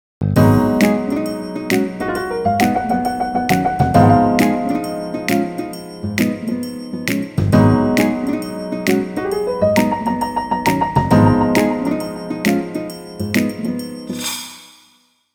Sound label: music End